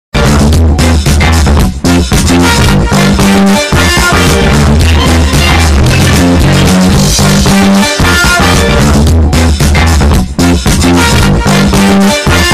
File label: disco; fortnite